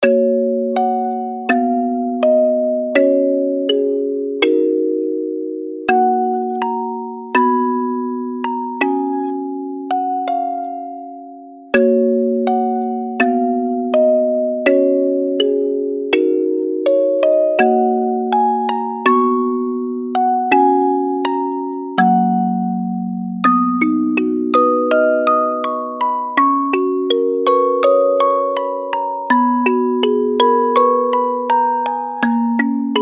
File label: music box noise